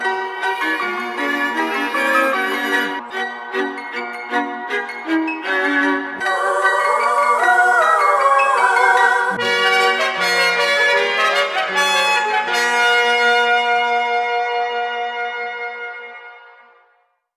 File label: piano voice keys horns, sad; loops